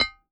Pots

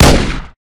pistol; ML-Agents; FPS Game

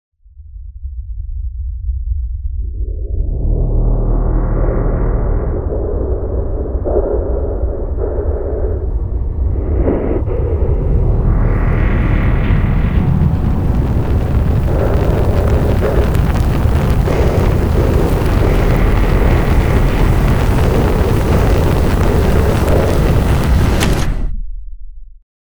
timeline seq wake up

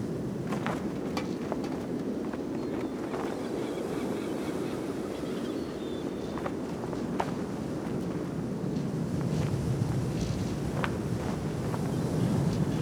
Building Blocks rooftops; Buildings; Megacity

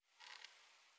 wood strain, Night; FontainebleauDemo

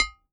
Pots

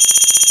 UI fx process

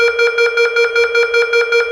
attack fx alarm; SpaceshipDemo

